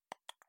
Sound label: ui click; Vengeance Project IADE Unity2D; Upgrades; Toggles